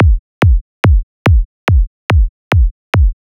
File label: Kick Example